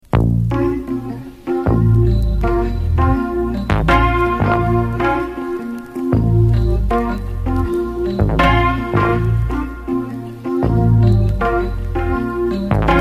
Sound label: rhodes intro